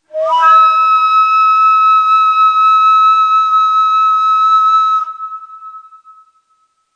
Tiz Saba, Neysample

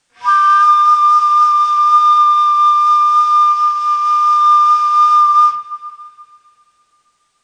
Tiz Chargah, Ney

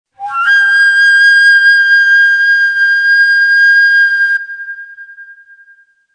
Tiz Acem, Neysample

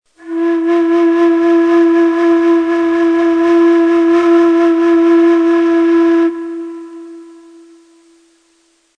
Yegah, Ney

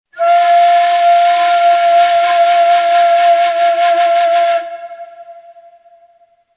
Beyati, Ney

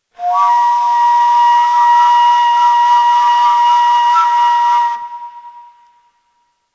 Shehnaz, Ney

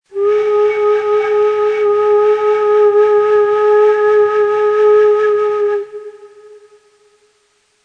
Arak, Ney

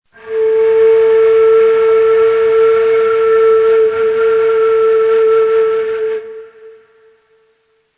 Rast, Ney

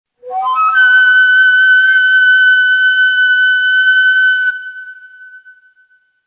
Tiz Huseyni, Neysample

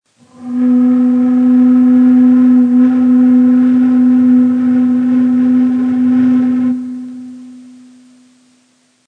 Kaba Dugah, Neysample

